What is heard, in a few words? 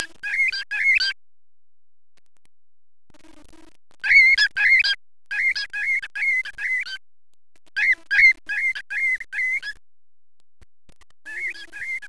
Birdys